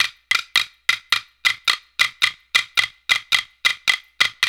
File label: STIX, World Dance; OZ!